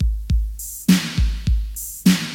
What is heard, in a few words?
102 bpm breakbeat sample g sharp key, Free breakbeat sample - kick tuned to the G# note